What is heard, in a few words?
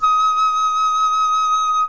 D sharp 6 alt, flute; modern